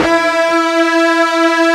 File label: FUZZ E 3, Guitar; East West; Steve Stevens Guitar Samples Collection